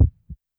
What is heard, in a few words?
Heart; YAMAHA RM1X